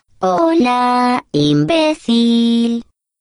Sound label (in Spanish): trampa